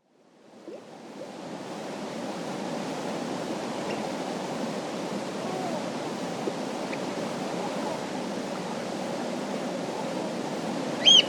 Lake in late Spring, night atmosphere with Oystercatcher & Artic Terns (Some wind); Outdoor Sound Effects; BBC